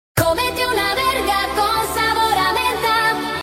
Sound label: nightcore mix